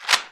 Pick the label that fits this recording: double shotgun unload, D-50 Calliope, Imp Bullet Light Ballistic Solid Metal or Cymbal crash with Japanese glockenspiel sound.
double shotgun unload